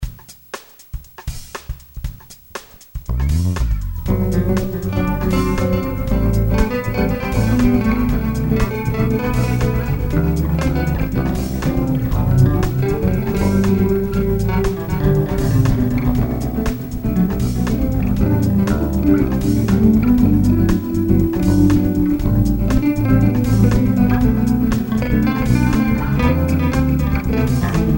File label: bass pop